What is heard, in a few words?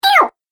Cartoon Game Voice "Ow"; Cartoon Voice Sound Effects